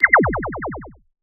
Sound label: flutter laser, futuristic weapons sound effects